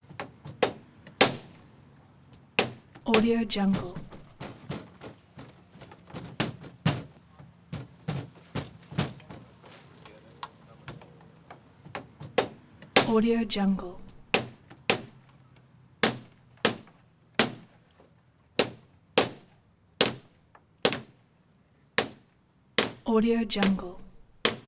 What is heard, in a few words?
banging window hard snappy impact; prosoundfx